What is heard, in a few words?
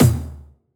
soft hit finish, Taiko; osu